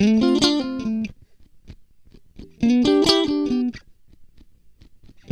Guitar Licks; Best Service ProSamples vol.17; AKAI

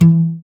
GUI AC, GUI Acoust. Slap; Sound & Vision; Gigapack I CD 2; GUI ACOUSTIC 2

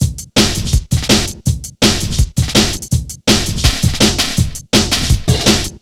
165, Drum Loops, Drum & Bass; Total Drum&Bass

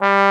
HI TROMBONE; East West; Global Sounds

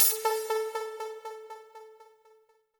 technosyn2, Techno Trance; Ueberschall; SYNTH

